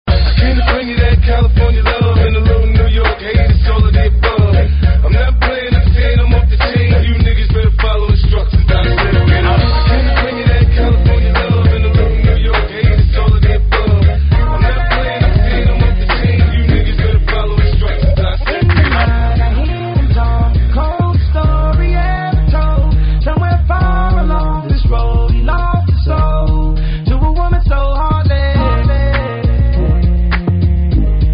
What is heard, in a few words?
funk; multitrax